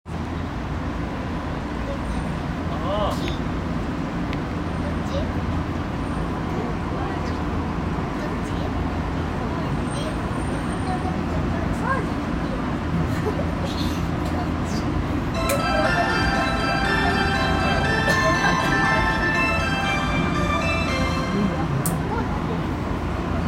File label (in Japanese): 副都心線東新宿南, train sounds